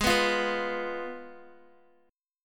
Ab Minor 6th Ab, down, Guitar, 4F, 4A, 5C, 5G, 5C, 6E; Abm6; strummed; JGuitar